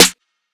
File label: Snare Stacked